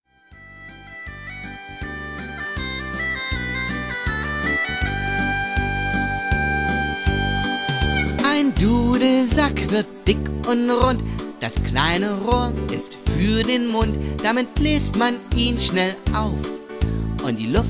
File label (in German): Lied vom Dudelsack